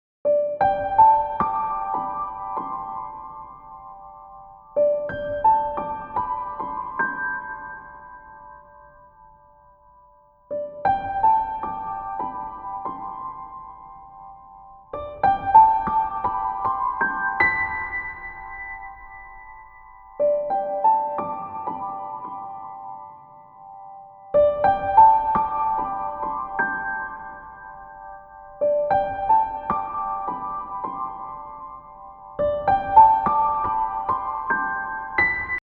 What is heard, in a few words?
PIANO SIMPLE; PROOF